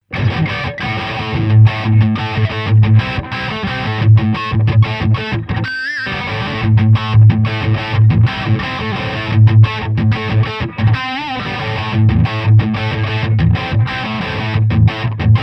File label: Crunch